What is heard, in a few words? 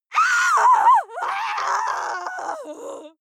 female lunatic scream; menschen; schreie